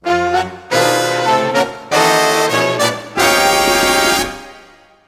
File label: Swing Police; James Noir's Hollywood Crimes